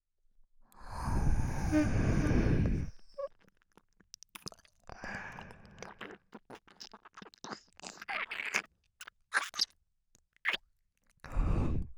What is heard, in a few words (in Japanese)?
エッチしよ 耳舐め, 左, バイノーラル; DL製作班; ハーレムオナサポ 4人のメイドがご主人様のオナニーをお手伝いするバイノーラル